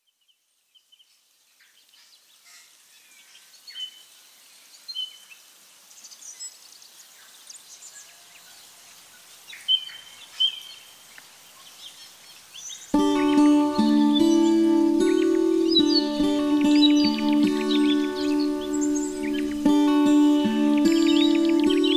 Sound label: acoustic guitar